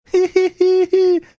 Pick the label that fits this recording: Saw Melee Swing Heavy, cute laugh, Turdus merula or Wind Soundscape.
cute laugh